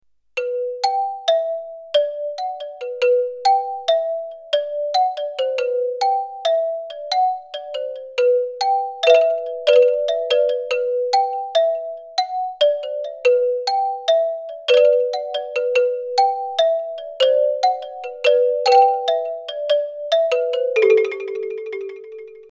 Octapad

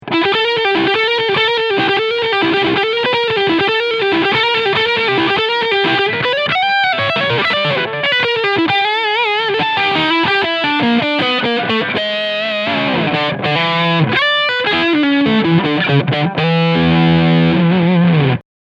Harley Benton SC 550 SL Leadsound Bridge Pickup; Tribute

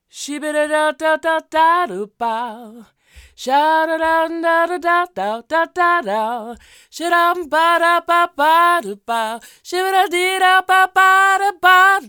Vocal Tone

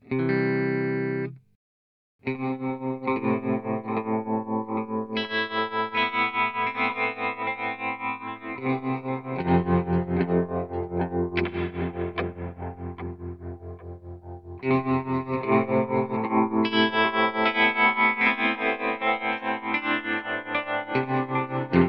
GFI System Duophony XY Mix LFO

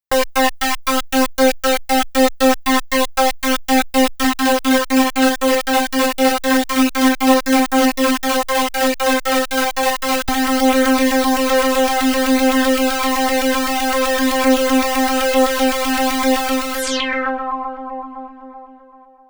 OB-X8 LFO Shift Voice 5 8 Unison SH

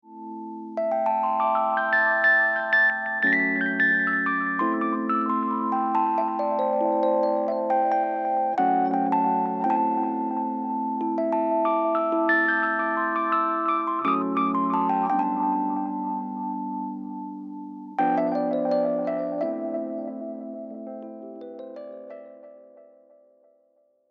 Rhodes; REPLIKA

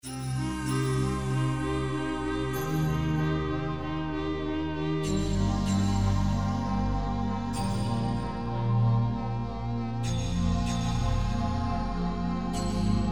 Digital Rompler Synths

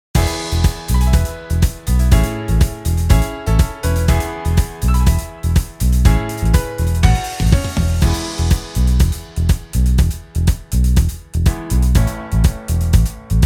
70s UK Disco Pop; Genos V2 Superior Pack

